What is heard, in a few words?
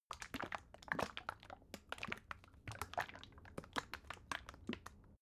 bottle syrup movement; berufe